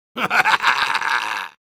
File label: laugh evil; Soldier